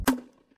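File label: Grenade Launcher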